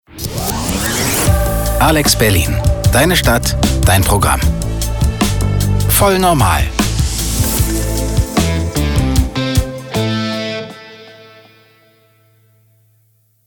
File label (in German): Jingle Stundenopener